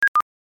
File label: local unkey with node connected